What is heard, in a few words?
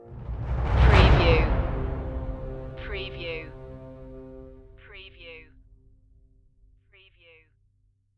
cinematic emotion stinger